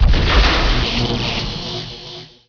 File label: attack; guild maker; Emperor - Battle for Dune